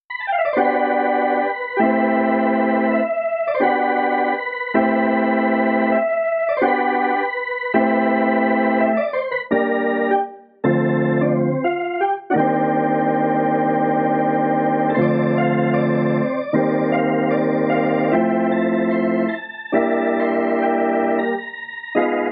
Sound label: Hammond, Organ